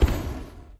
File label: GEMS Gem; Puzzle Chronicles; PS3; PSP; X360